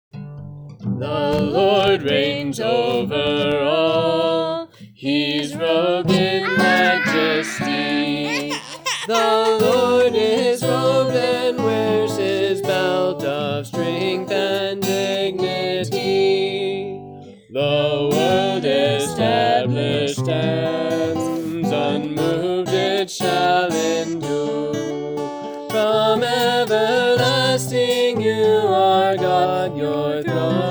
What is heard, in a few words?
guitar